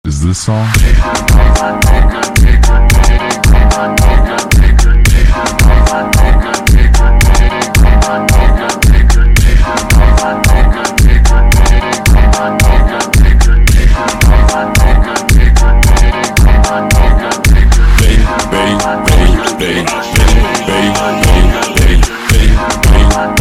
slowed, funk

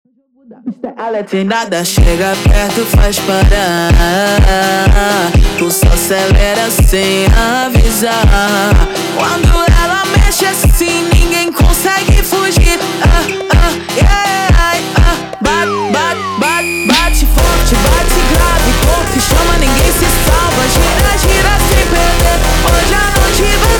Montagem slowed; فانک

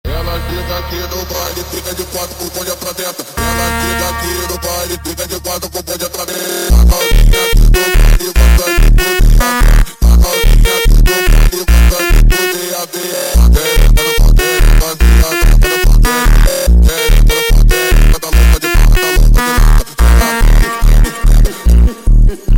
sped Up, funk

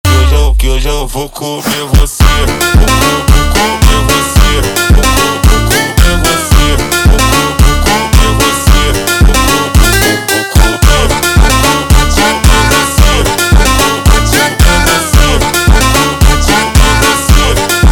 slowed

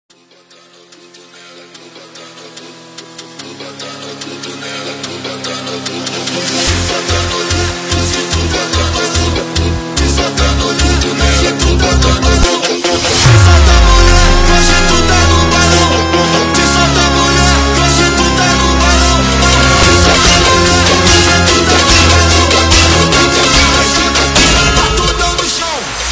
Funk, sped Up

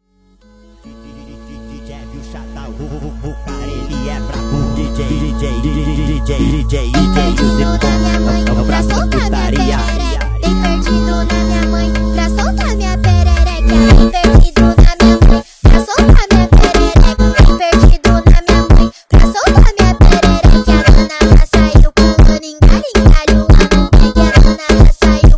Funk nightcore

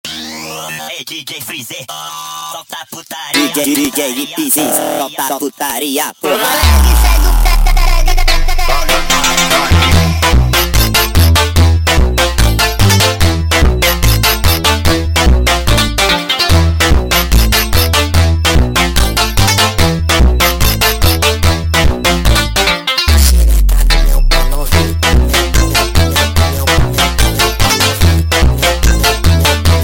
sped Up, funk

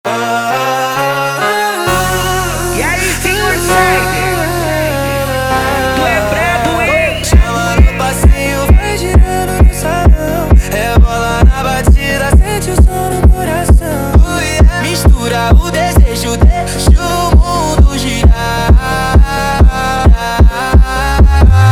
Funk

